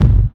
studio bass drum single shot G key

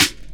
clear snare d key; snare drum samples

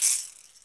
studio acoustic shaker one shot; percussion

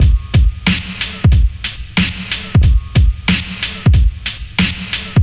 92 bpm 00s drum loop G sharp key; drum loops

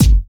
studio kick drum single shot G key

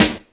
good steel snare drum sample g sharp key

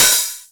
light pedal hi hat single hit d sharp; hat samples

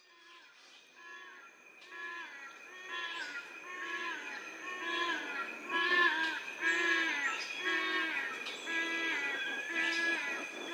yellow casqued hornbills birds singing

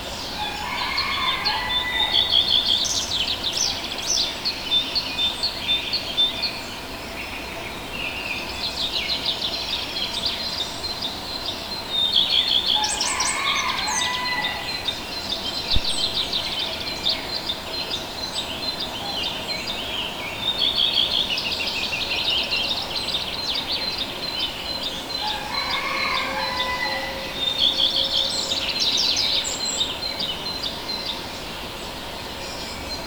early mountain birds habitat; soundscapes

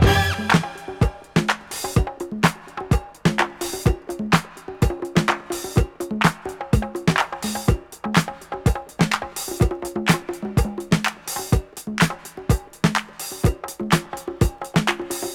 125 bpm high quality breakbeat sample f sharp key; drum loop

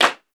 hand clap c sharp key, linn claps

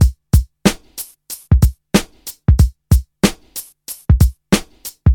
93 bpm boom bap rap drum beat c; drum loops

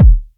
rich low end kick drum one shot e key

